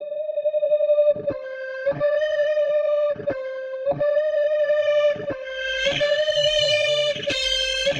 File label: 120 C Riser, Spaced Out Guitars; Loops; Touch Loops; CosmicFunk